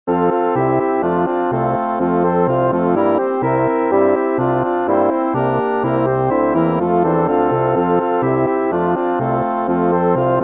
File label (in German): Polka